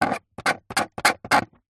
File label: foley small spike scrape scratch thin plastic; zapsplat